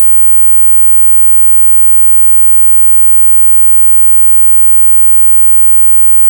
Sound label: anti mosquito sound